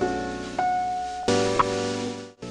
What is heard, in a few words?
LOOP, Akai MPC5000